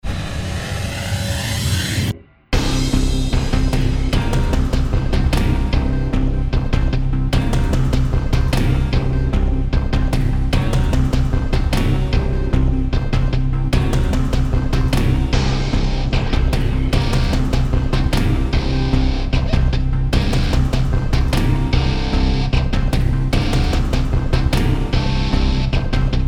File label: Foundry Ambient; Tower of Guns